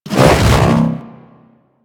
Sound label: Hoverboard Jump Spin; Warframe; Vehicles; Venus; anim sounds